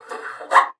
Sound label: SCHMATZ, BASSOON; Xsample VOL-6